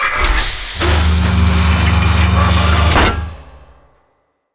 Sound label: elevator door